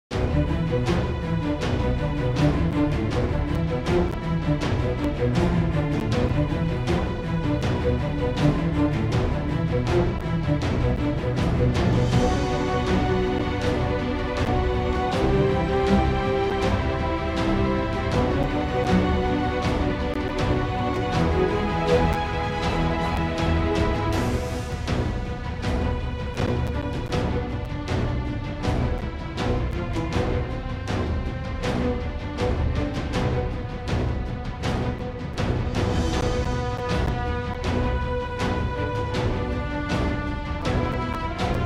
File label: Final Boss; Crystal Caverns